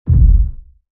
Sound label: Bass Impact Normal; impacts